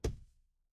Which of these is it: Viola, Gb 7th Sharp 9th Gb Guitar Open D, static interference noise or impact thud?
impact thud